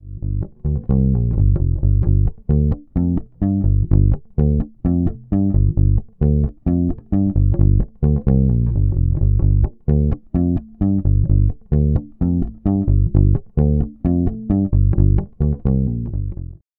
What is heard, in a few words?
Fender 1965 Precision Bass Tone